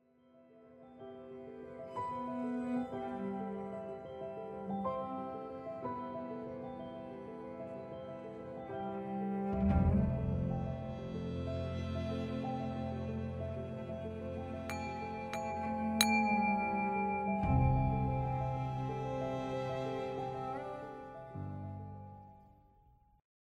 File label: Chime; CAVEDAY